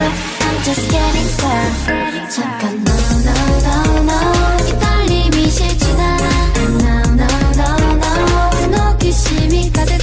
Chorus

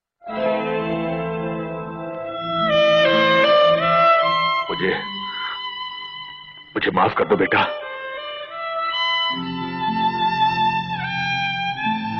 Sad Hindi Music

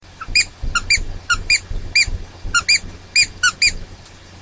Squeaky

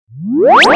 Wifi Msg

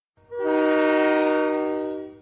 default horn; Trainz

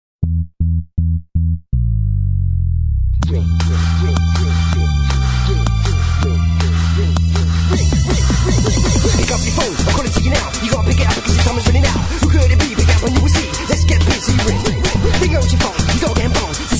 Drum Bass

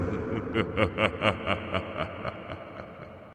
Vampire Laugh

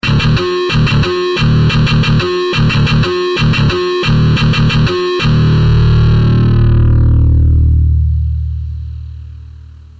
Electric Guitar Rock

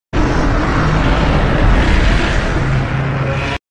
roar; Dragonine

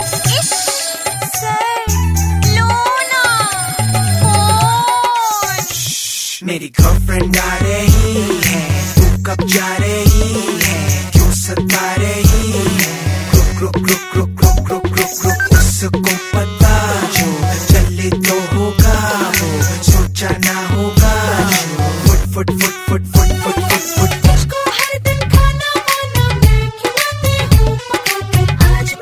Hip Hop

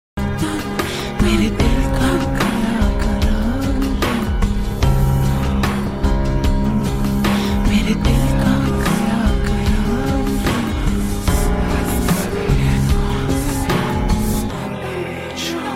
Hisssss

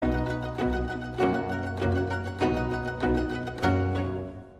Mandolin concerto

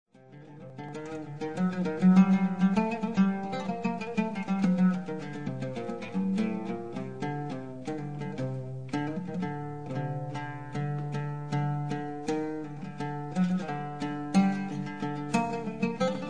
Solo oud

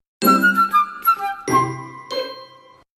Jingle; Fairy Tail

